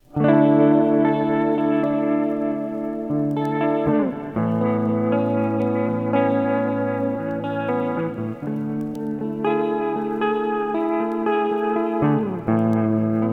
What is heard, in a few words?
Tape Wobble Noise Machine; Guitar Rig 7